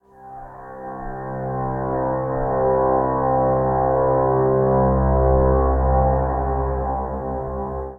Olivine Drone, Guitar; Instruments

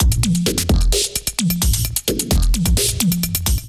Robot Parts Kit groove 130 bpm, Glitch and Wash; MIDI Clips; 122 - 130 bpm; Ableton

